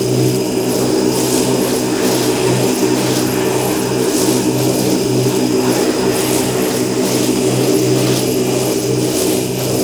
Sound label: Thurible Spectre Loop; Warframe; Priest